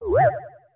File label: goomba flattened